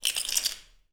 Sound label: Glass Scrape; 99Sounds; The Warehouse